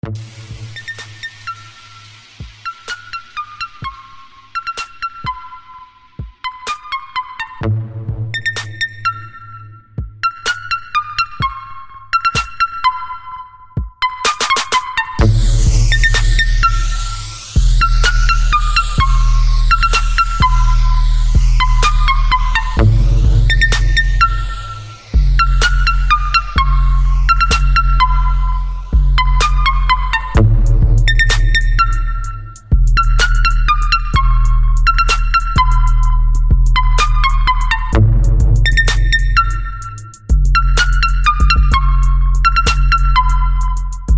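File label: Bass Boosted 70Hz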